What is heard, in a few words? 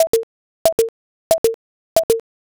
fs geta